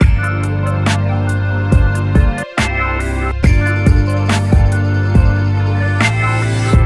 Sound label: Bpm70 I R; Magatama